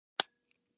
Light Switches Click

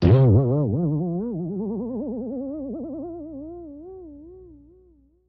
Bing, metallic, insecty, long, going up; bings

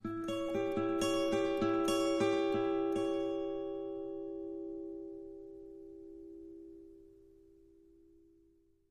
Acoustic Guitar High Ton

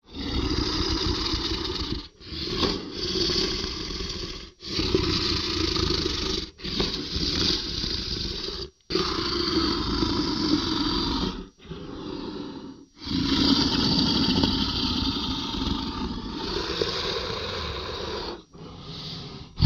Monster Stalks Roar, monsters

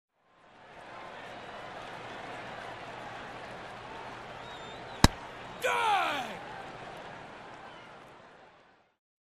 Baseball Pitch Strik, pitch w/crowd

